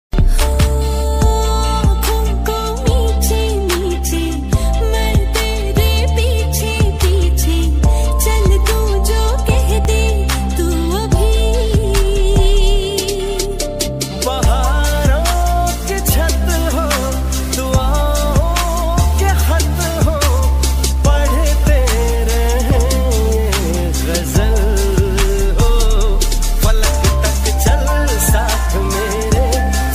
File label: ringtone love